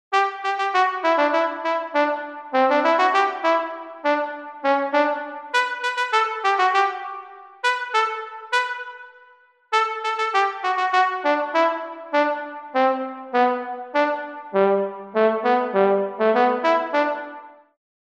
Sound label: trumpet staccato